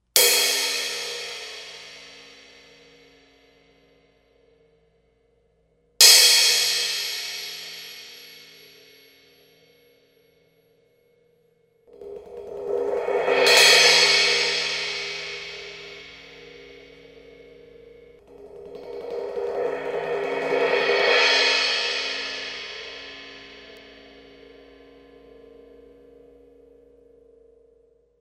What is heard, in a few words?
paiste innovations thin crash 16